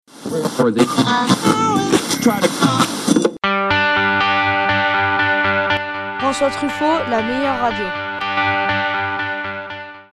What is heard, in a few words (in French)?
jingle